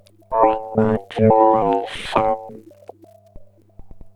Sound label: ringmod